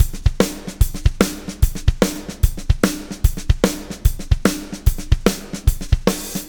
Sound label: POWR, Backbeat, SHUF